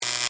Scifi, Arc, Electric, Zap, Buzz, Science, Fiction; Tech

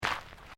FOOTSTEPS SNEAKERS GRAVEL FINE STEP

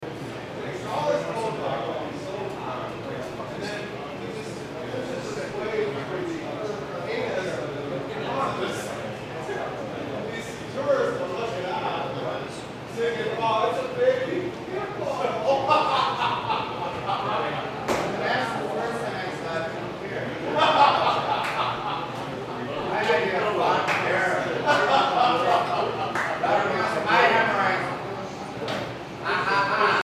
Barroom; Barroom Ambience , Man Talks Loudly . Some Foul Language; Bars and Clubs

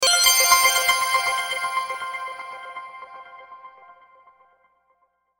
Logo Bright Delay Musical; Sonic Logo Sound Effects; Production Element Imaging Element Accent Transition

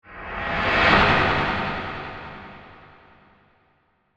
Whoosh Flange Wipe, Reverse; Whooshes; BlastWave

